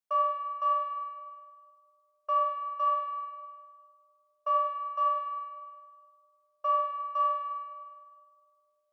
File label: Fire Truck Siren Far